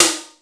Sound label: DANCE SD, TR-808; East West; Global Sounds